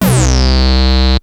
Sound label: sync bass, Fender Chroma Polaris